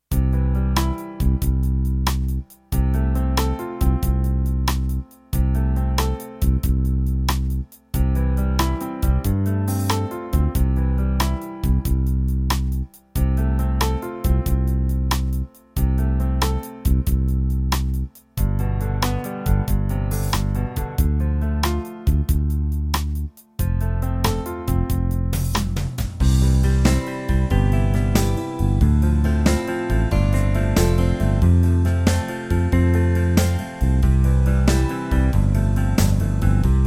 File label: 12-8 Ballad